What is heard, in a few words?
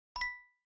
Plink